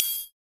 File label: metal hit